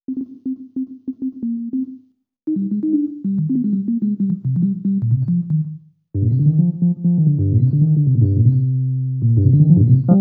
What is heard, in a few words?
Rhodes Solo, Cinematic Funk; Sony Creative Software; Urban Tone Poems; Some Water